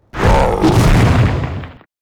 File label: CRAWLER REX attack